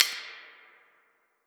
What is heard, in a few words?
keys; foxdot default